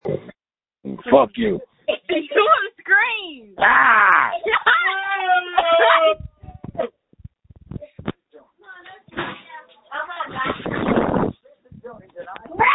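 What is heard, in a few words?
screams that feature laughter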